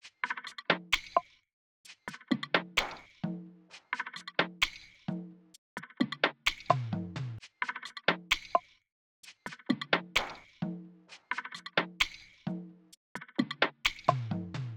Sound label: Drum Loop 65 BPM Percussion; Loop Stems; Cymatics; DOPE DRUMS VOL. 1; Peach